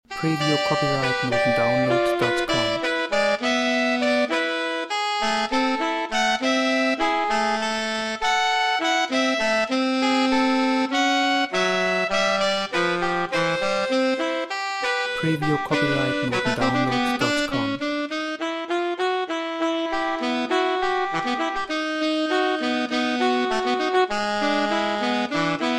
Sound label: SAX